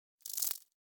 Insect Clicks

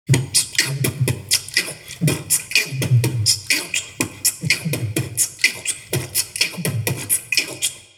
beatbox jungle 16 beats

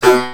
Male Hurt Robot; Mars Sortie

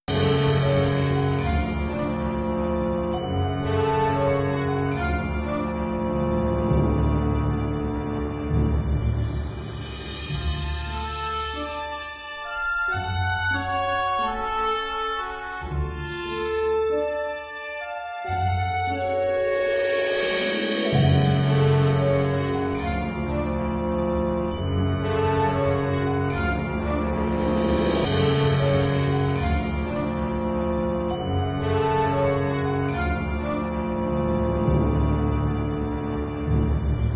Goals Complete; Buildalot

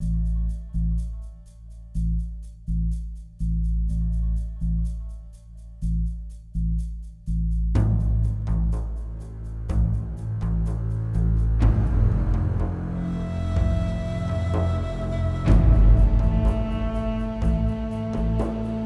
iraq loop; Soldier of Fortune Payback; PS3, Windows, Xbox 360

